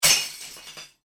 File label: Bottle Smash Heavy; Smashes; Objects